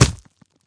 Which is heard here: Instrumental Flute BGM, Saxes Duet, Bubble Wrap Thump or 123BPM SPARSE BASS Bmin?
Bubble Wrap Thump